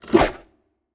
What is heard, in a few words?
arrow release and swoosh